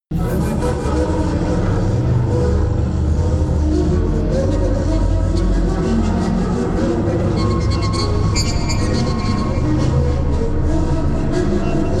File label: drone industry